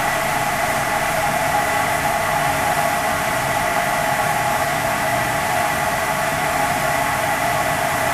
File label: steam medium; boneyard; Titanfall 2